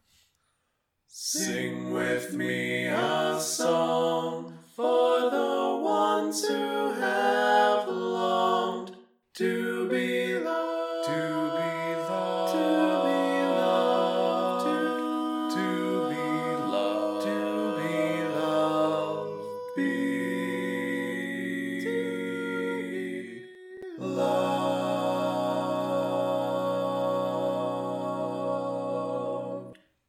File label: Tenor, barbershop tag